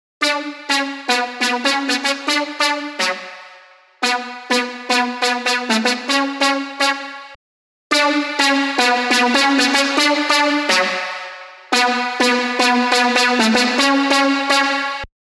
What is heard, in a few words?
Bounce Lead